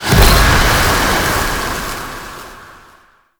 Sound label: Void Dragon Firebreath Cast Poison; Enemies; Abilities; Warframe; Duviri